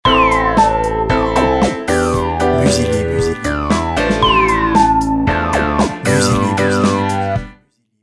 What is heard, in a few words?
vintage disco